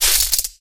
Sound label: cloth foley